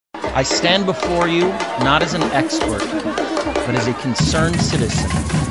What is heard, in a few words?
Carnival